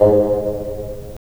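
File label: wind bassoon; LA Composer vol. 3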